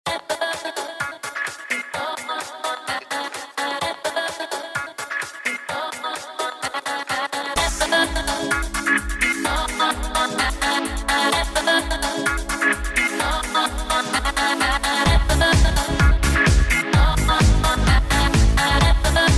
Dance

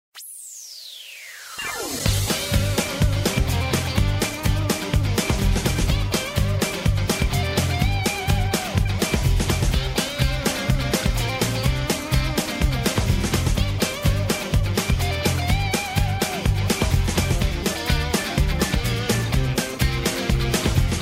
Party Night Rock

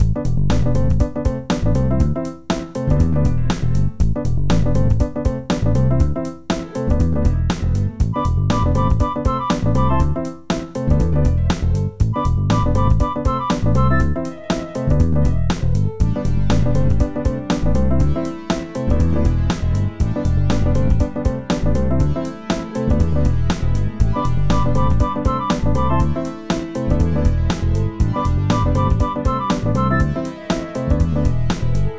Swingin

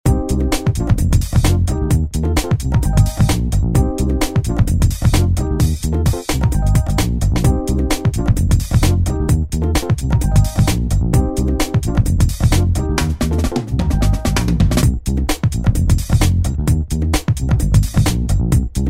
Upbeat

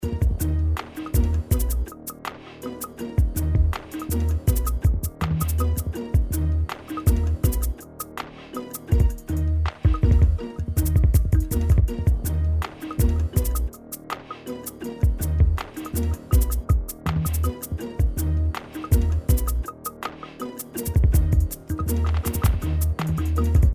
Hiphop

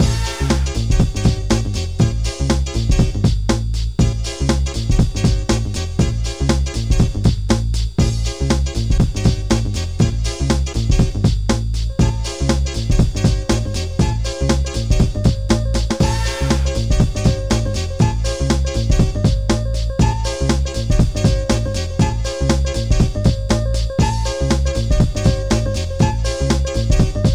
Upbeat

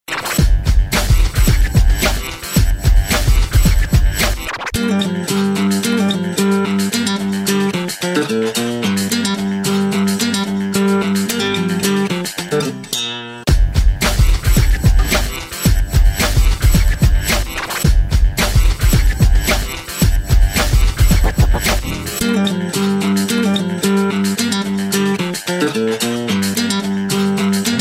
Rock

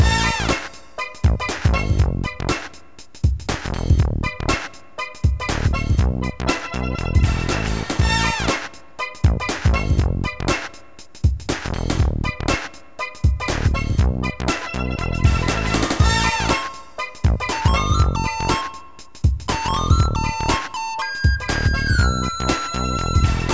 Corporate

